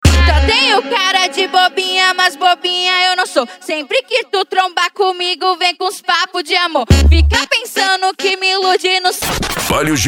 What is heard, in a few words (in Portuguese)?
funk mega bass e forro; urupa ro